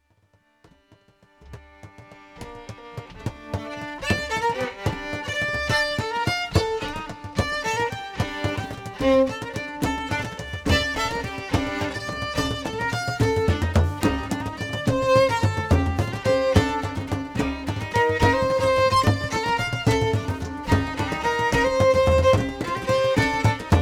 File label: Fiddle and drum; iona